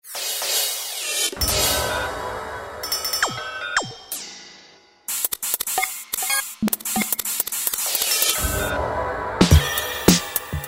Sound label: Dr-FX Kit, Yamaha EX5R; Factory presets